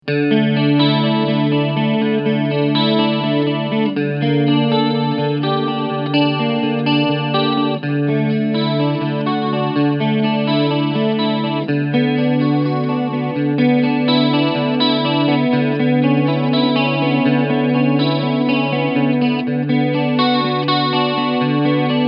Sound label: Mooer Hornet 30 Clean Chorus; Preset JC